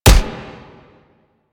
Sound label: Alt Punch; Weapons; Warframe; Fulmin Prime